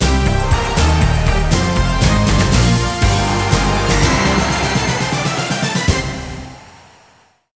Radio sports

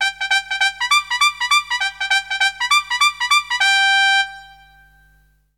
Military

